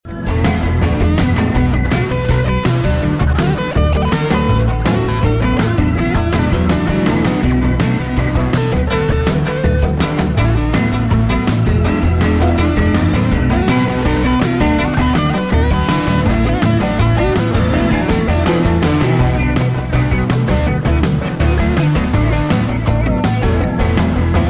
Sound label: Good old groove Ringtone